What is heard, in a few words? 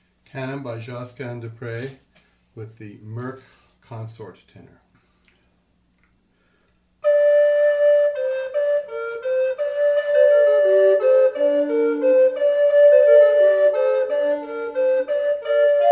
Ren Tenors, recorders; Moeck; Josquin